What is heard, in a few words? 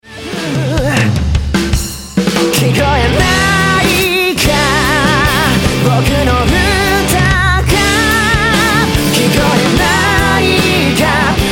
humming